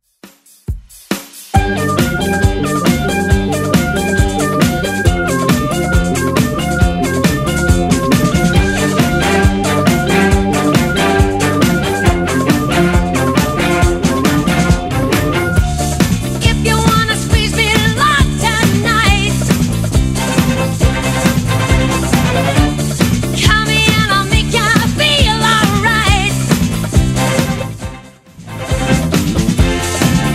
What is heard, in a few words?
70s Redrum 137 bpm